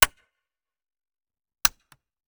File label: Musket Foley; Sound Ideas; Guns